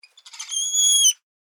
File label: Animal Spider Monkey Screech Stutter Angry